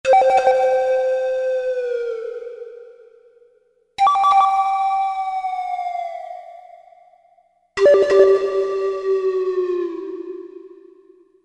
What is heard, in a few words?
Good&Bad, Korg M1; Factory preset examples